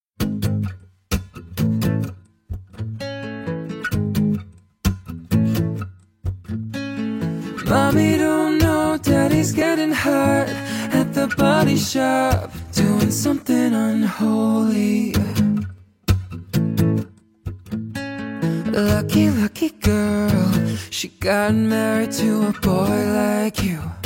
acoustic ringtone

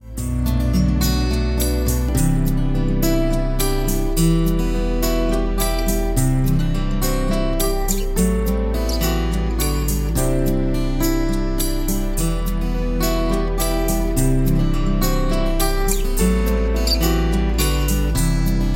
christian ringtone

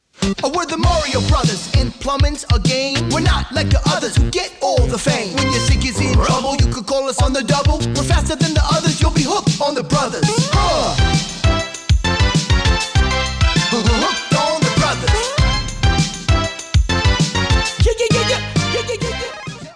rap ringtone; mario brothers

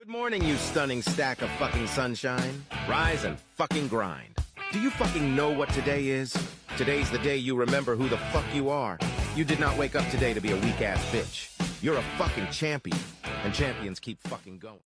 good morning ringtone

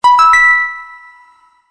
message alert ringtone

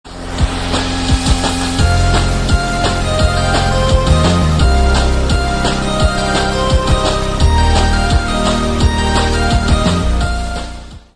8bit ringtone; android

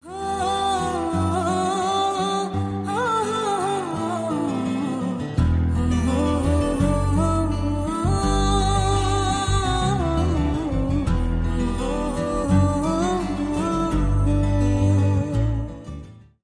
sweet with guitar ringtone

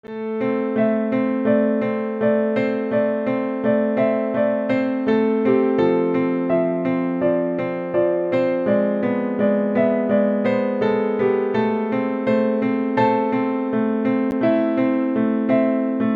piano ringtone

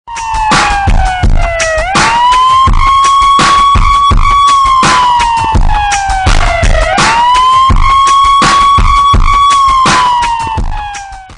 ambulance remix ringtone

